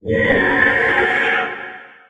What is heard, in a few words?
poltergeist attack; STALZONE; customnpcs; stalker